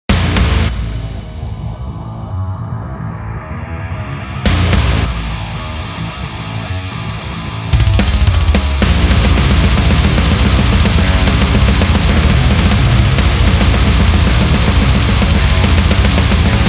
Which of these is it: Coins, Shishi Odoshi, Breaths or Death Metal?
Death Metal